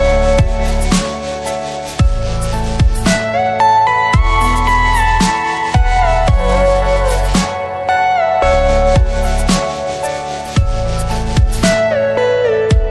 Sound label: Sad Ringtone